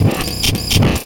Criket Fx Fill, Cricket

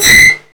GEIGER, Hard & Loud Techno; USB Soundscan vol.01; AKAI

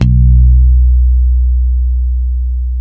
B 2, ultra pick, bass; Spectrasonics; Bass Legends CD 1

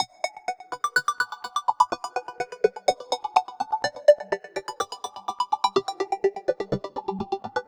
little rock F# 125, synth loop; Zero-G; Soundclash; Nu Dance Electro

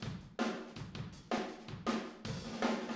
Fill Room, Drums, 162 BPM; Room ST; Drums from the Big Room The Mixes; Take Your Toms Off

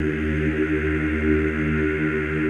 CHO ES1, Eeh; Eeh + Ehh

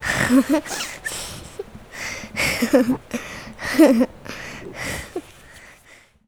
CHILD VX C, Indian Traditions

